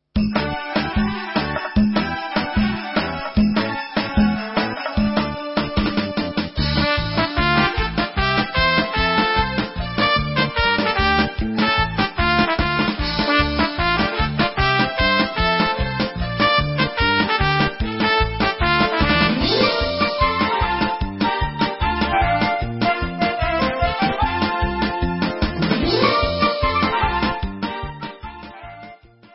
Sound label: Instrumental